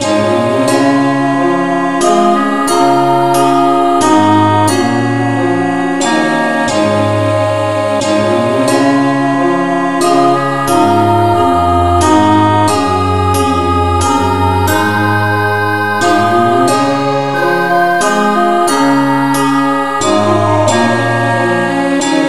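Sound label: no vocal key D flat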